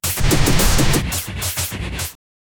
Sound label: create intense battle sou, combat